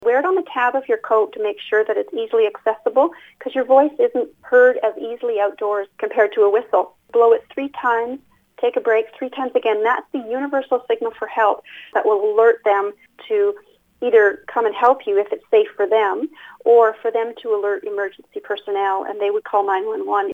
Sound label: whistle